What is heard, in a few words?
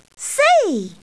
BUZZ; QUIZ